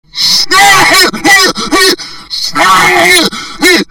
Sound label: scream, zombie screams